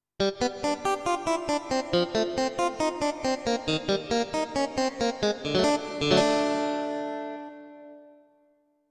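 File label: Clean Guitar, digital piano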